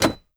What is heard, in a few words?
autoturret movement click; Rust